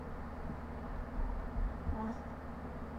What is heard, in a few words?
strange noise; four seasons resort; gravy control